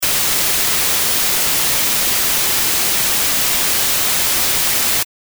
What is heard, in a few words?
processed white noise